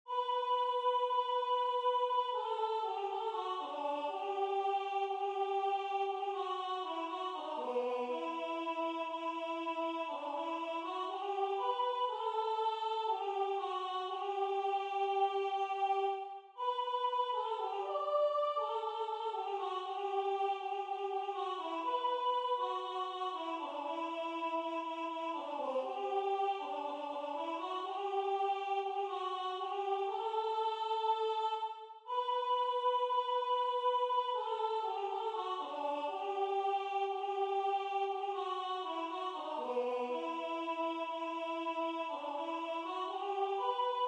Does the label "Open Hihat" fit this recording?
No